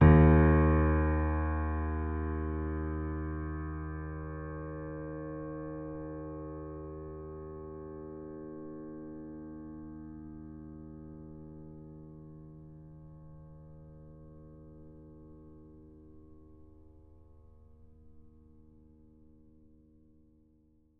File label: D sharp 3